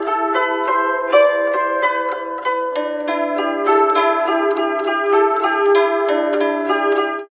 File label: SITUR, SITUR PH; Spectrasonics; Heart of Asia